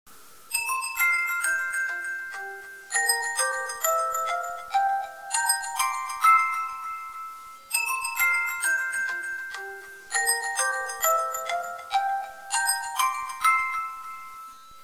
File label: Xiaomi Ringtone